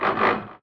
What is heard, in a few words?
GEAR GRIND; SIMUZENSOUND